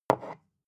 glass bottle pick up slow

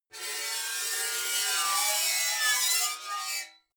metal scrape rich straight

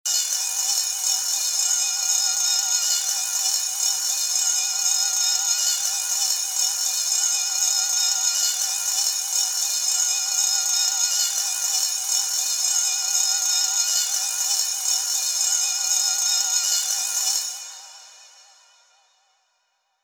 bowl

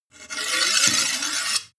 sword blade scrape long

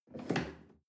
chair wood take medium

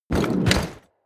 chest wood small close

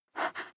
breath female short

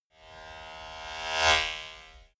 servo small whoosh

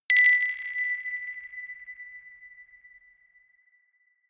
notification glockenspiel rattle single

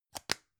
gum plastic box close

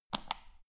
power button turn on off hard quick

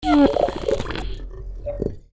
kybernetic link

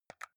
console controller xbox arrow key press fast

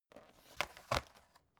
folder close faint

